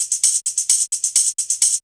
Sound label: drum 130 hihat, Techno Trance, Drumloops; Techno Trance Essentials